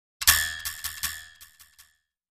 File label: Twang W Echo Wooden Tw; boings / twangs / snaps